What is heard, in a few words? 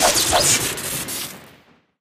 slap swing crit; tf